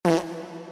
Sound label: fart